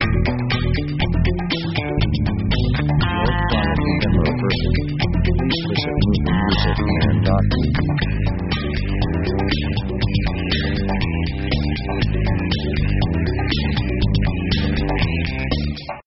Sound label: pop 120bpm marching rock; rock themes